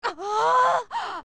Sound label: Big Gasp; OOT; Young Link; Zelda